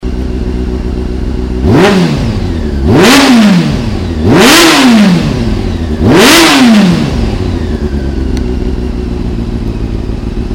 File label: akra, F4I